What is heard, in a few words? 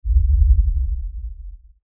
LFE Tremor, Lfe Tremor Low Bass Shake Rumble; Rumbles; Blastwave; Sound Design